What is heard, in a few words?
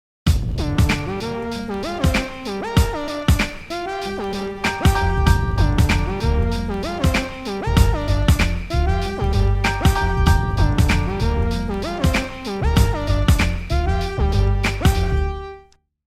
Hip Hop Loop